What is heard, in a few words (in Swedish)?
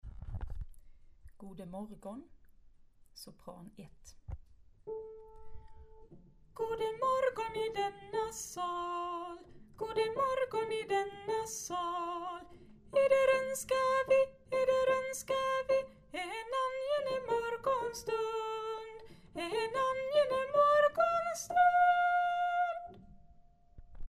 Goder morgon sop